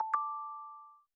Payment Received, iOS Sounds

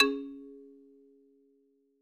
Empty Space; quinton's new sound scheme

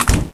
door close; Golden Crayon Sounds

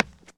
concrete step; Golden Crayon Sounds

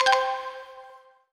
broadcast; TTCom Soundpacks

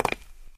tile land; Golden Crayon Sounds

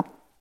tile step; Original TK Sounds

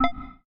recover focus; ChromeVox Classic Sounds

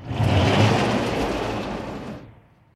door open; Golden Crayon Sounds

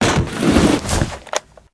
shelter storage take; Original TK Sounds

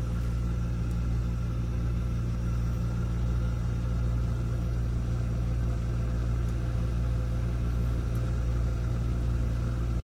freezer; Golden Crayon Sounds

